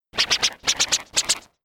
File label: Dj Crazy Vinyl Whoosh